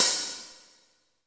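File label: hi hat, drums; Best Service; Real Mega Drums VOL-1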